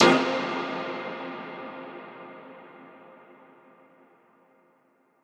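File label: 123 F minor Disco Fx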